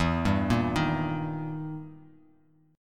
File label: E Minor Major 7th Flat 5th, E, down, Bass Standard; arpeggiated; JGuitar